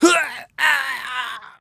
VO Death; VIP

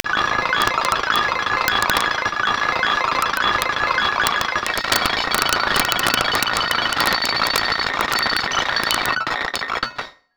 Radio Banjo piano; Barnyard; playstation 2; Hittin hay

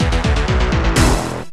Credit Sting; Burning Rubber; Amiga; AGA Version

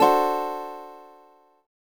Guitar Strum; the legend of zelda phantom hourglass gamerip; Freedle

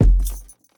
Footsteps Wood Walk; The Town

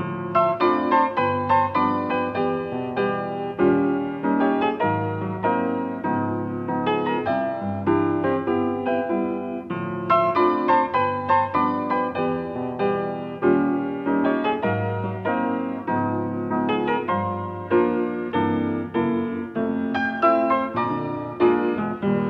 Lounge Piano; Franken

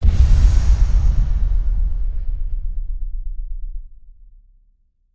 warrior light beacon explode; Aragami

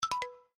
Print complete; Windows 7; Savanna

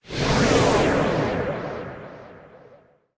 phone

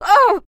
pain; celeste; Idle Champions of the Forgotten Realms

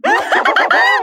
Hit

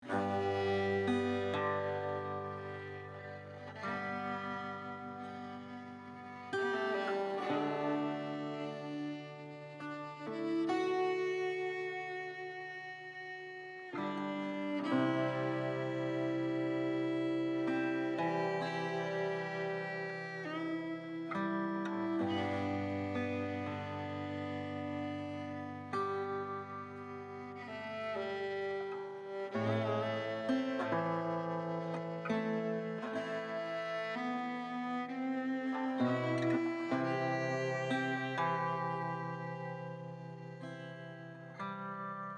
Neutral Loop

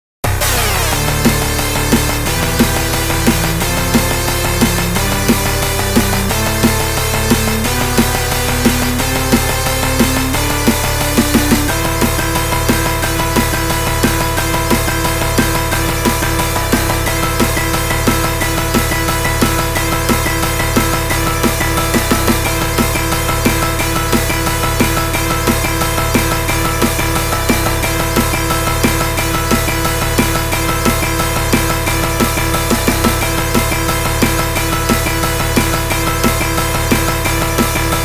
ENSURING SAFETY (ZONE CLEAR); R-Type Original Sound Box

